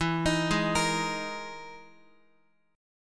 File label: E-Major Sharp 11th E, Guitar; EM#11 arpeggiated